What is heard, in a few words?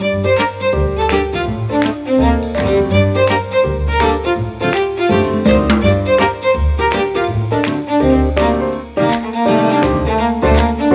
cheerful strings; by burak sound bank; cheerful vol 12